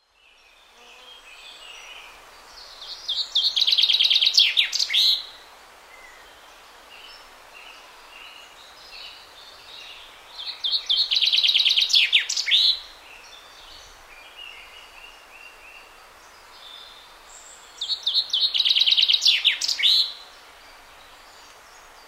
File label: chaffinch